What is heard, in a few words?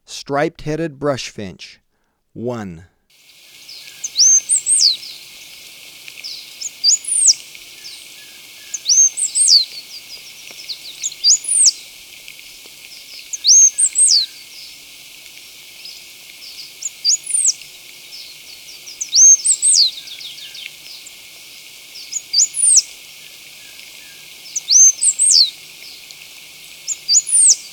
Stripe-Headed Brush-Finch, Birds of Ecuador; S. America